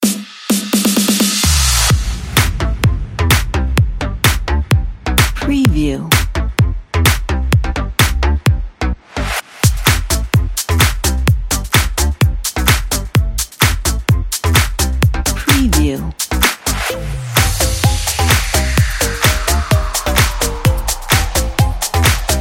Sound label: deep blue house